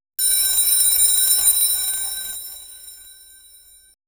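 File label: smooth peaceful transition, school bell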